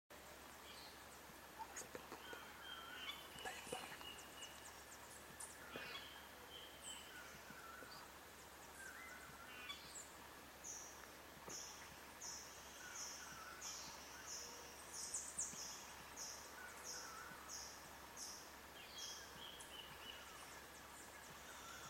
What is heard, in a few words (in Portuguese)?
Canto do gaio de peito preto